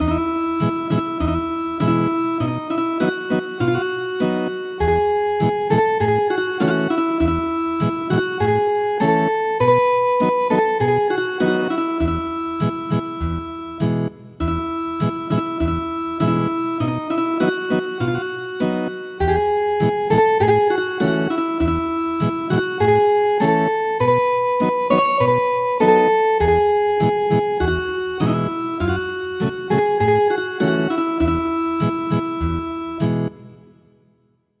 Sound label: Ethnic Guitar; Happy New Year Greece